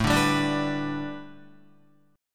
A 7th Suspended 2nd Suspended 4th, Guitar, A7sus2sus4 chord; A7sus2sus4 strummed